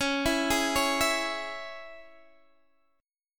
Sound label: C sharp Minor down Guitar Open D